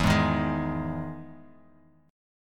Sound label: E Major 7th E down; EM7 strummed; JGuitar